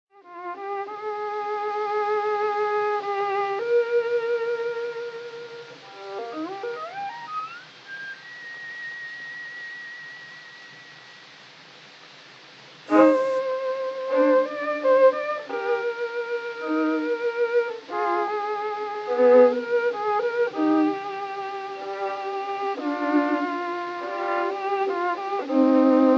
Intermezzo; From The Violin Maker Of Cremona

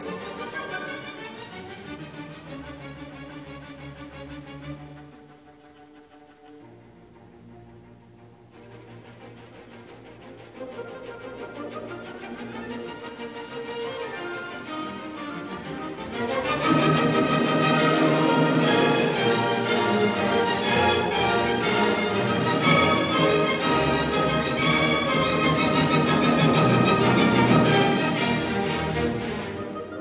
Symphony in E flat major Scherzo Allegro vivace; Beethoven; Op 55; Eroica; Oswald Kabasta

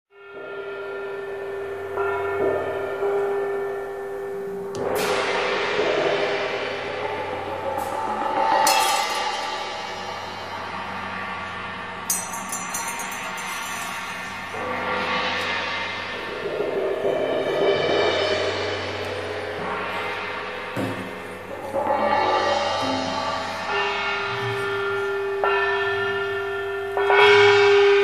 Hymn of Creation

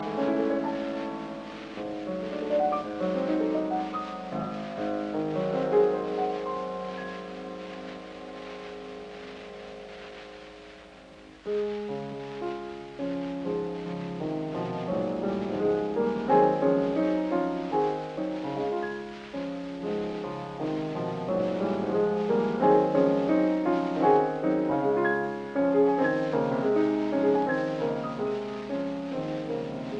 Etudes in D flat major; 12 Etudes d'execution transcendante; No 11; Harmonies du soir

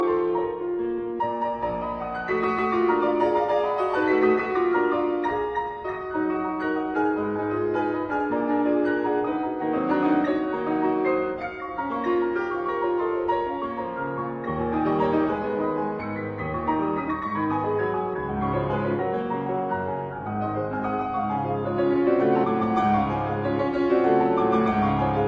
Etude in F sharp major; Henselt; Op 2 No 6

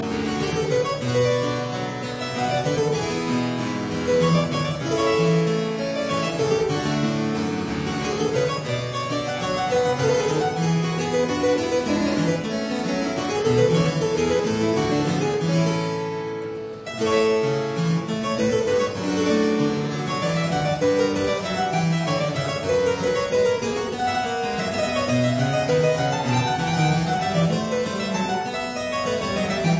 Suite in E flat major, Courante

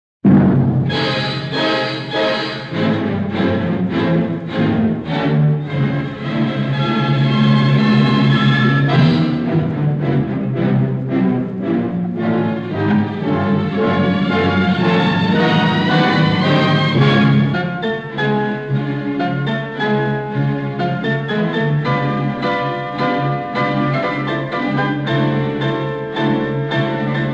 Allegro maestoso; Khachaturian

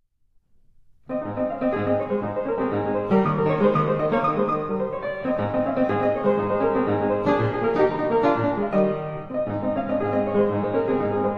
Etudes for piano, A flat major

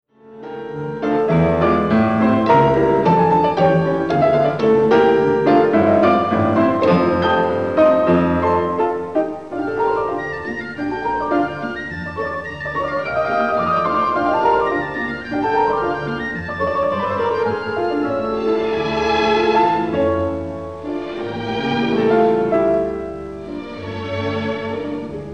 Piano Concerto No. 2 IV. Allegretto grazioso; Brahms

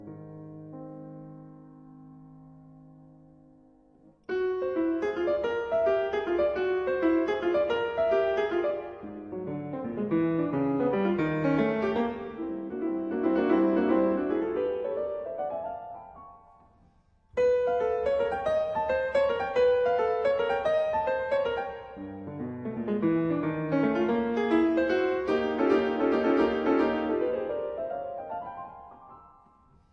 Cubanas for piano Son; Hernandez; Juana Zayas

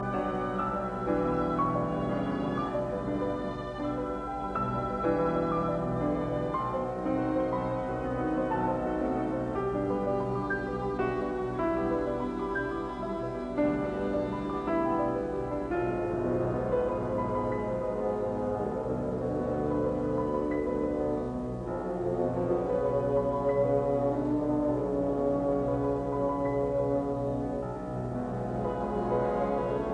Piano Concerto Cantico Largamente; Op 39

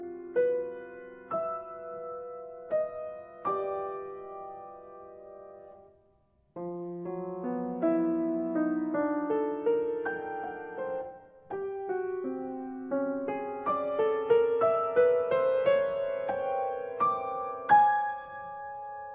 version for piano Adagio sostenuto; Evocations

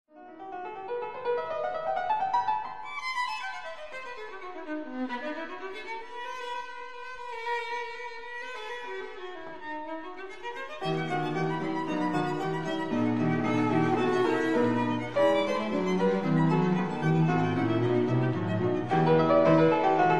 Piano Trio in E minor Presto; Louise Ferrenc; Op. 45

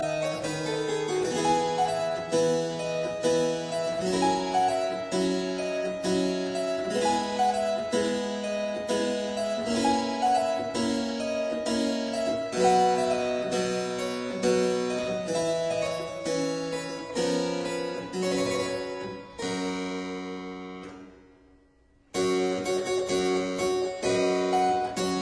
Keyboard Sonata; in E major K 380

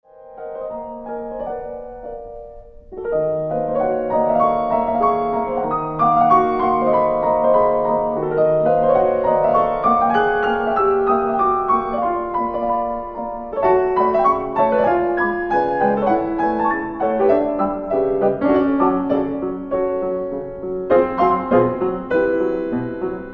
Preludes for piano Andante Grazioso

